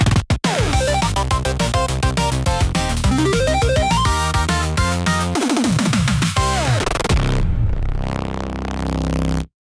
8bit level up fanfare variation; free jingles

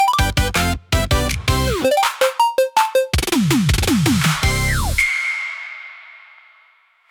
bonus lamp tento call, jingles; free jingles

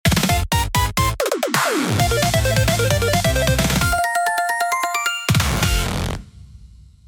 oatari dodon fanfare; free jingles

